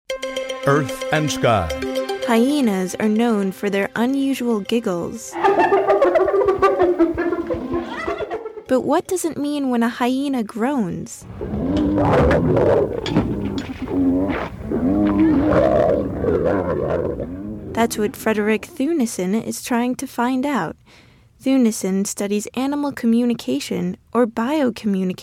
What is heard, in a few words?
Hyena Groans